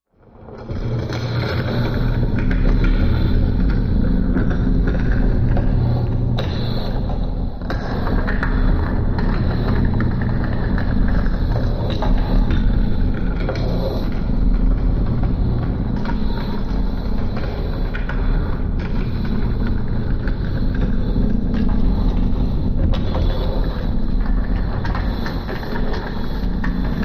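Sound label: Rolling Along Deep Rolling, ambience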